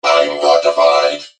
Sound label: mech hurt vo